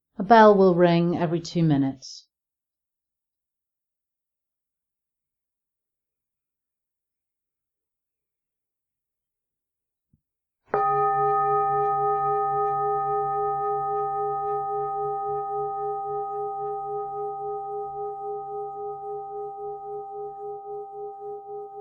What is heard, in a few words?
Bells and Silence